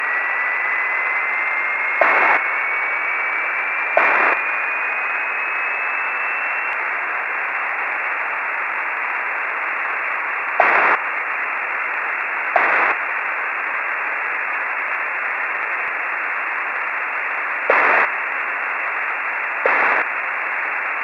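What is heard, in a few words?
FIREBIRD, satellite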